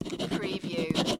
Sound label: Pen Write On Paper